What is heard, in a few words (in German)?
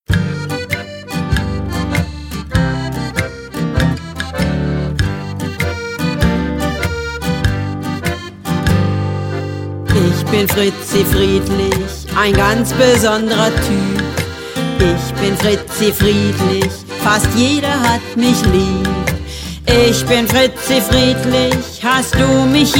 blues